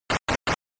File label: SNES RPG Enter